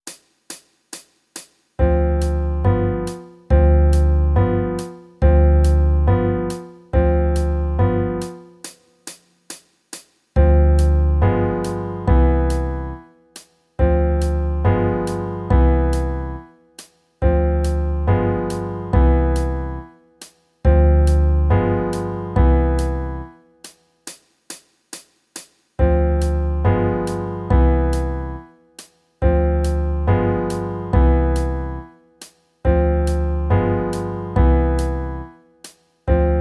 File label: Jazz backing; Guitar Techniques 2019 Issue 294 Spring